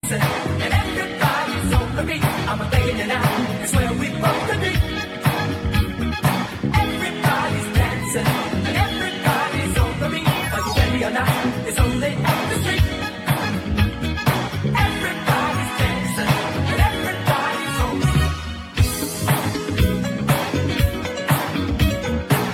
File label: beat